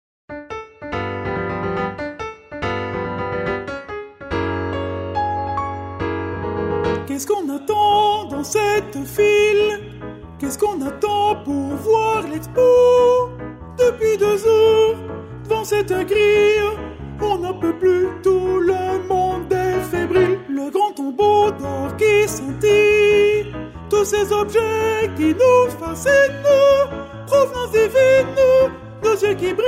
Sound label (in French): SUB SOPRANO